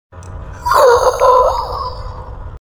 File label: zombie defeated sfx; zombie pack sfx